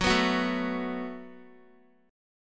F sharp Minor Double Flat 5th F sharp down Guitar; strummed

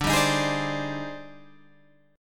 Eb Major 13th D, guitar

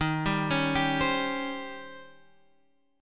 Eb 13th, Eb13 chord; Eb13 arpeggiated; x,6,5,6,8,8; JGuitar

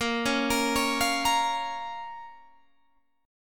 Bb Minor, Guitar, Open G; 20,18,20,18,18,20

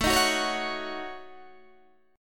A 6th Flat 5th, Guitar, DADGAD

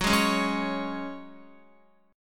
Gb Suspended 2nd down Guitar, chord; Gbsus2 strummed

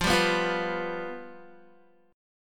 F Suspended 2nd Flat 5th, Guitar; Fsus2b5 strummed

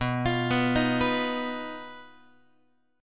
B-Major Flat 5th B down Guitar; arpeggiated; x,7,8,9,7,10; 4A,4E,5A,5D,5G#,6C#; JGuitar

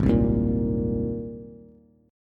Ab Major Add 9th Ab Bass; Abadd9 strummed; JGuitar

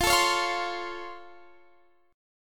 C sharp Augmented Major 7th F, guitar chord

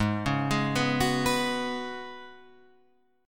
Gb Suspended 2nd Suspended 4th Ab

